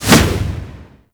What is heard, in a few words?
Finisher Whoosh; Warframe; Kuva Lich; Finishers